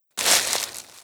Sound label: grass Footsteps; voxelverse